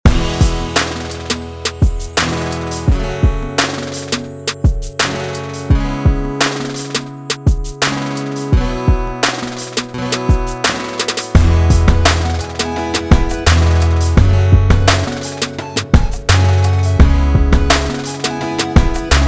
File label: Acoustic; MVP